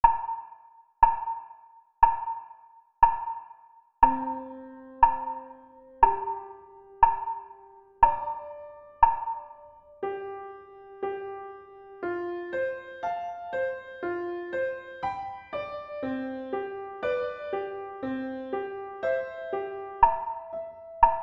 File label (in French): alto